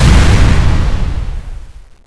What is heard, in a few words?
svd exp; cstrike